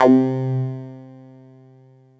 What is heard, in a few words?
C4, Clave; Orchestral; FL Keys